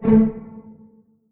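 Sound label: string pizzicato A2 pianissimo left; Sound On Sound - Peter Siedleczek's Orchestra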